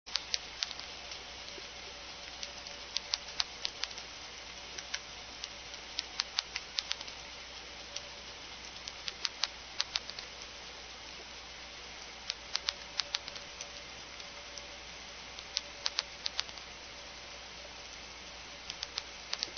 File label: periodic scratch mystery